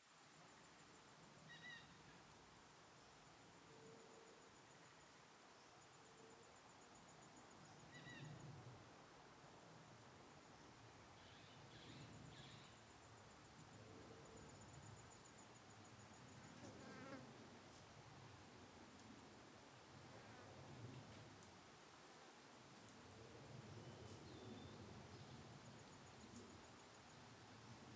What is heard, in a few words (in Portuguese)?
Aviao Passando em Mata Fechada da Trilha Simao Correa; colecao sonora do cerrado